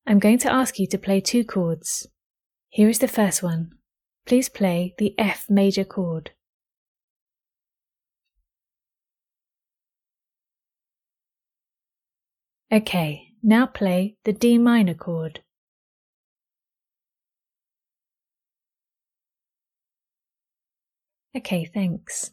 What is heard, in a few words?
Chords F Maj D min